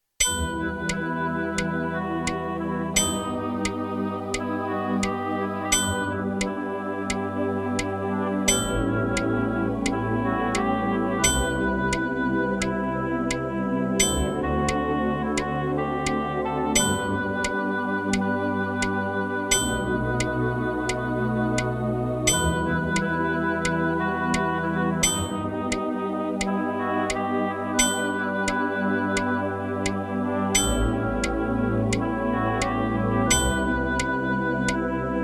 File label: feelings with metronome